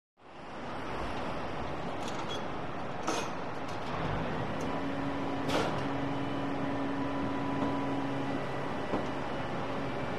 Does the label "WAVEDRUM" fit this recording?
No